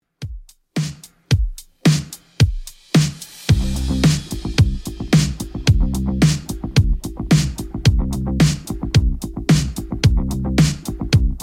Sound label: 110bpm